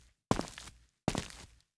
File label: Footstep Asphalt; this is the zodiac speaking 2020 gamerip switch ps4 xbox one pc macos